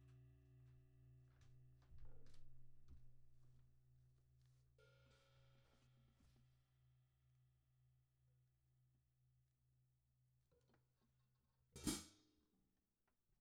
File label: perc bridge hat